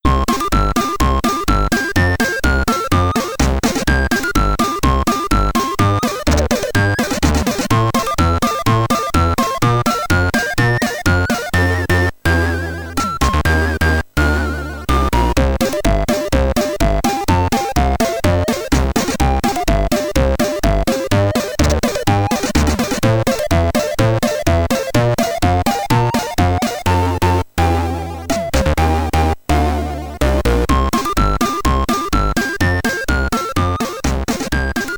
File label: Level Completed; super trucker; commodore 64